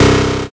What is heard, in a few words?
zap